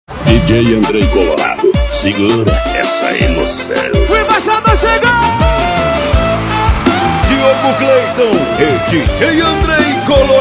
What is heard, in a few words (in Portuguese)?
sertanejo com locutores de rodeio